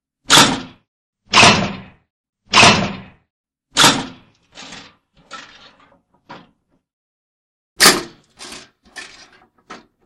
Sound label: Toaster